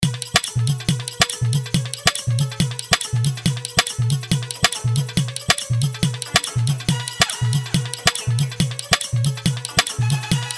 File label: Hard Dhollki Bass Mix